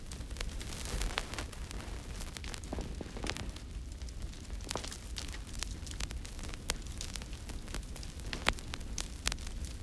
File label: labyrinth revival stage ambi candle